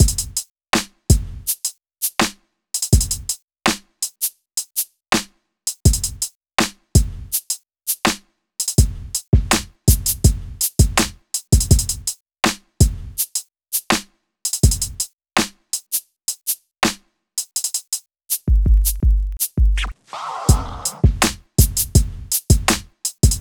Drums, Hip Hop

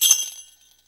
SLEIGH BELL; AKAI S-Series CD-ROM Sound Library VOL-5

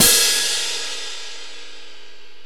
CYMBAL, ORCHE PERCS; Crypton - DrumBase5000

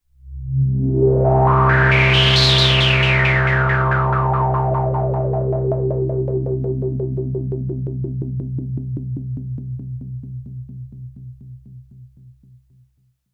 pad C2, Moog Minimoog; Pads

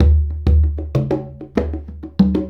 Congas; Essential Percussion

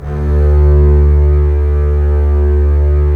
STR CBS, Roland LA Composer; Roland - LA Composer vol. 4

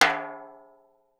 timbale, ethnic set; Voice Crystal - Steve Reid Definitive Percussion

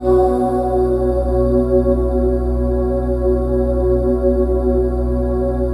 PAD, Dance Mega Synth

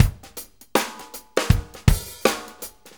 Shuffle Loop, Full Kit Loops; Sonic Foundry (Sony Creative Software); Loops & Samples Drum Tools

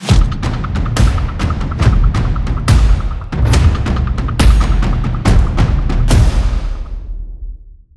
War Drums Attempt 40 BPM

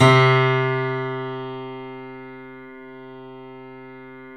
3D Pianos, Yamaha C7; E-MU Producer Series Vol. 5

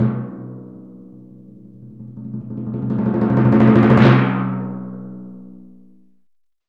PRC TIMP RO, Timp Cresc; Roland LCDP03

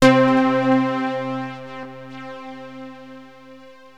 Access Virus B; Synthworx

